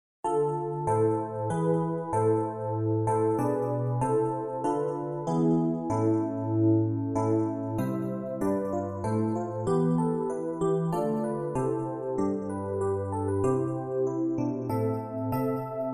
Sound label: Ballade